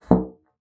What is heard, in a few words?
BASSOON; Xsample VOL-6